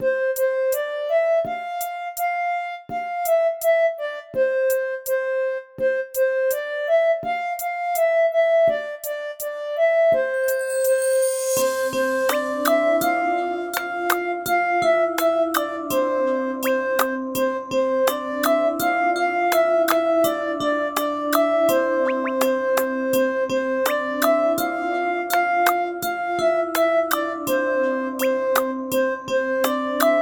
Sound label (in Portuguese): infantil